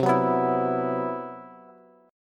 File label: C Major 7th Flat 5th C, Bass; CM7b5 strummed; JGuitar